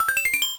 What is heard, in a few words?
1Up; SMA4